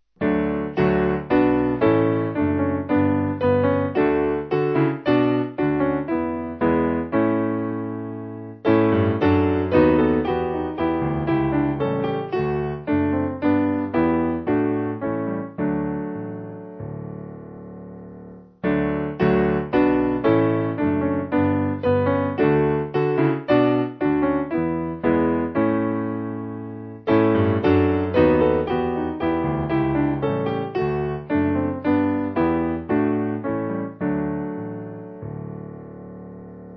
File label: There Is A Book SPiano; 7/Eb